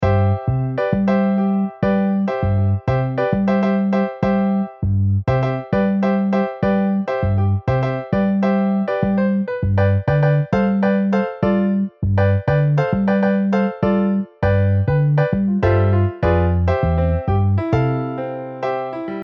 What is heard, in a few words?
Piano Bass MIDI